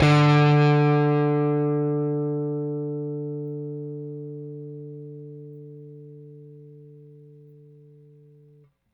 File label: Rickenbacker Bass; Airwindows